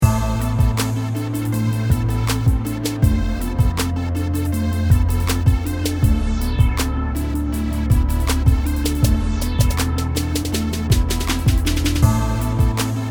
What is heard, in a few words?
instrumental